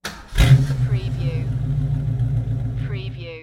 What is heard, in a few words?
Mustang 68 Fastback Start Up Exhaust Perspective